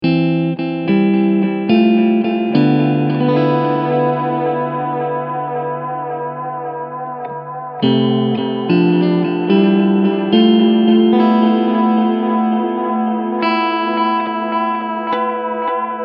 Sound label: Echorec Long Delay